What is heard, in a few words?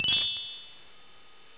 Troid Pop In; Safari